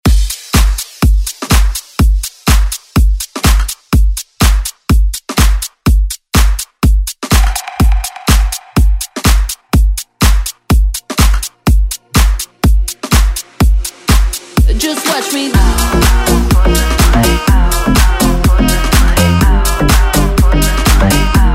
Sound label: Pop Dance Redrum